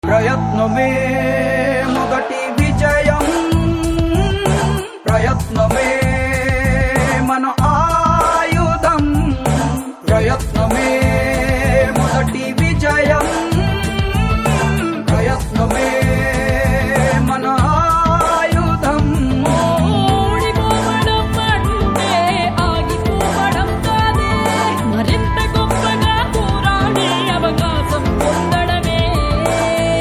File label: Telugu Ringtone